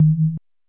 Vibe fast; Motorola M1000

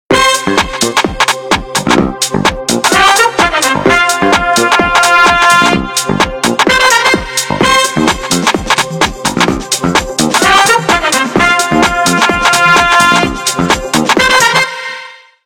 Brass; Sony Ericsson W980

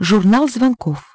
menu; Hyundai H-MP718

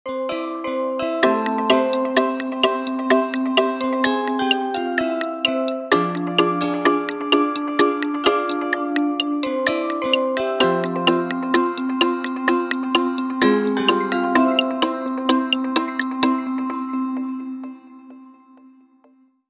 Pendulum, alarms

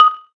electric etc, keytone; SKY IM-A760S-VEGA-Racer

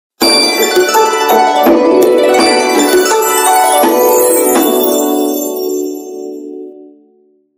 Gliss tune; Samsung SCH-W689 Wave; Ringtones

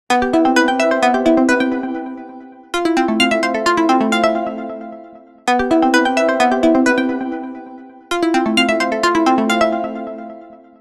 Alarm, Nokia Lumia 1520; Lumia 1520 South Africa

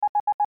zoom out

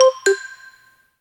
Click, notifications; Yota Yotaphone-2

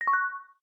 vector lock, phone tones; LG K10 T-Mobile

